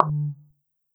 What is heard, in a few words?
pause hover